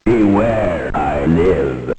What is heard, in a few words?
alert